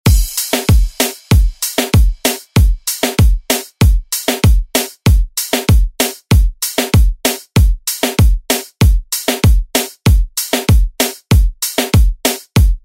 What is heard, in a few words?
90s ReDrum; DJ Mhark